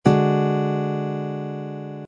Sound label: d flat five chord voicing, guitar